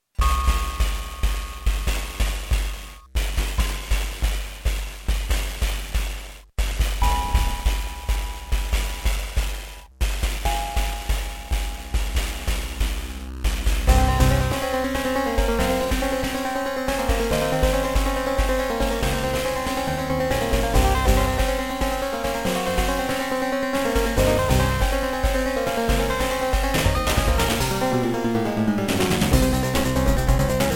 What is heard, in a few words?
Beepblaster 2.0 SYNTHMODE; sigil doom episode 5